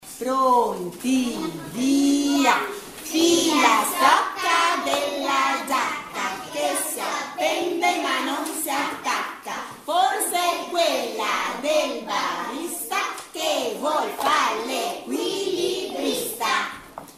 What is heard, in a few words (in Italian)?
FILASTROCCA DELLA GIACCA